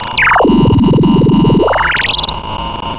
Sound of a cat meowing

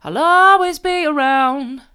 i'll allways be around soft, vocal

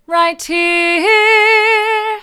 right here mid, vocal

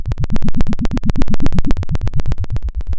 Sound of a helicopter

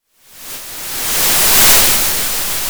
Sound of waves crashing on a shore

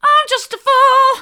mid, vocal

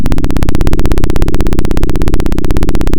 Sound of a plane taking off

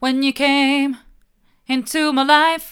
mid, vocal

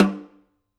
Snr Off, drum

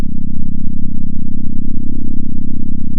center, sound of a small motorcycle

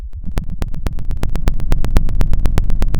pop, Sound of a fire crackling

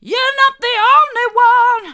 hard, vocal

